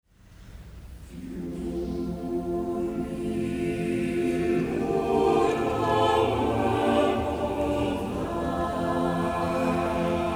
Chor Spektral Repair

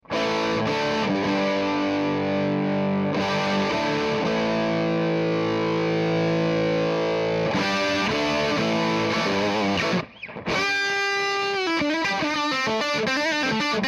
Steg PU verzerrt

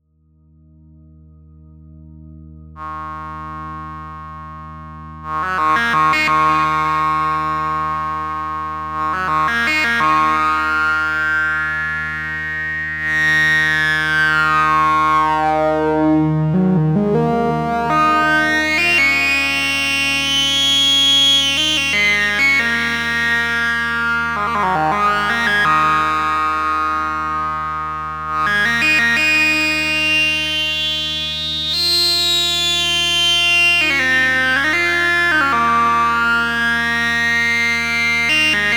Graphic VCO Space mit FX

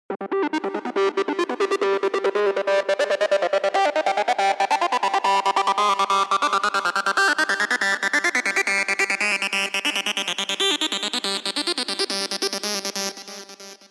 Lead isoliert

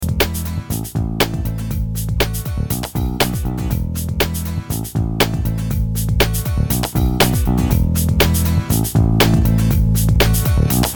altes Tape

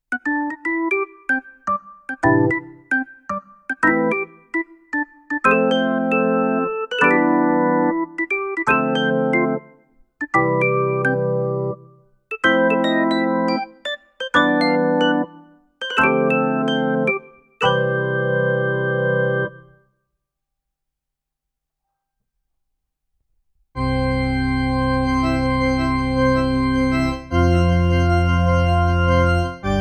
CN23 Orgeln

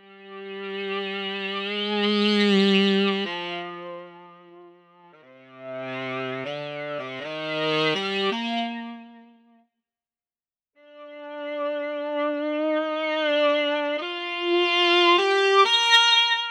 Yamaha YDS 150 Tenor Flanger mit Pitchbend

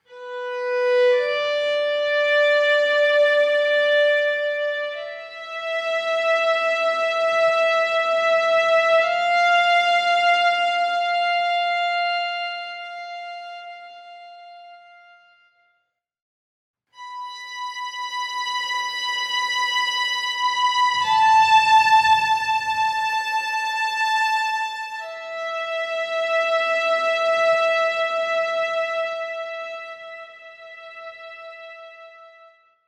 Strings Violine; Sacconi

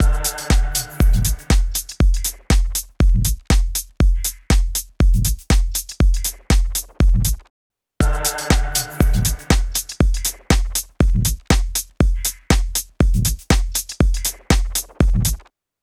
TECHNO eqing Beispiel Sättigung Band

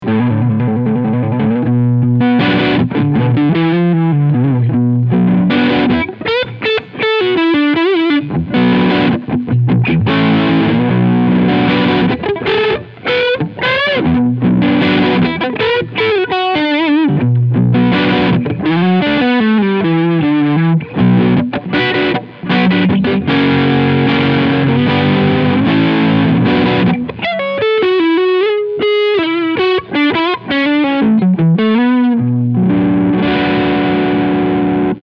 Crunchsound Front PU

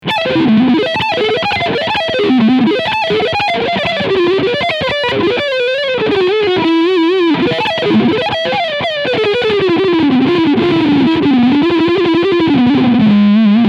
Epiphone Les Paul Classic Worn E Gitarre Overdrive Leadsound Neck PU

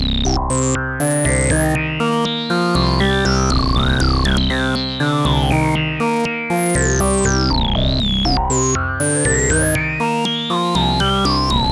Analogfilter

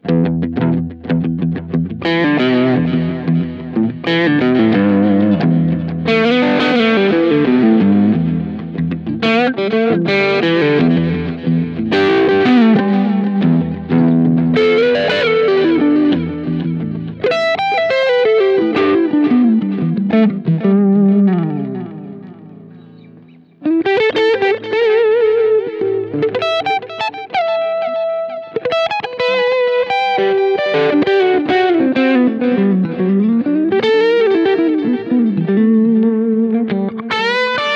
Schecter C 1 Exotic 3 Pickup Positionen Split Breakup

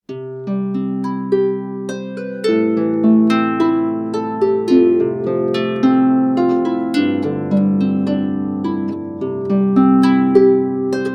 Harfe

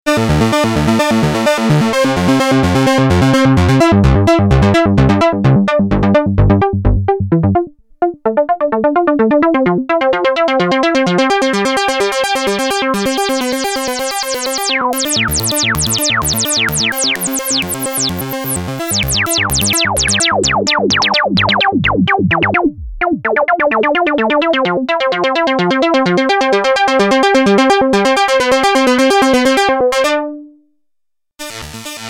Filterfahrten

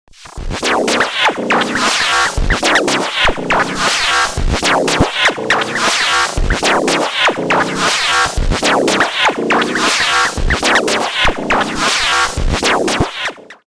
Erica Synths Perkons HD 01 Wild Noise Beat Kompressor

